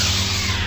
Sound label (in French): Alumage sifflant, sabre laser; effets speciaux